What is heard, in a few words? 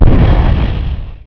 boooomm; rumori